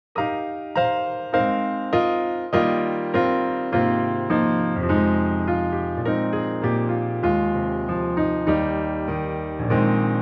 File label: accomp piano only live, choir; ring bells; christmas 2021